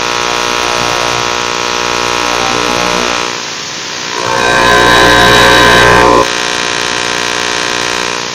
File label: computer screen organised trace with t coil resonance